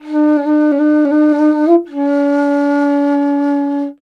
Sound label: INDIA BASS, IND BASS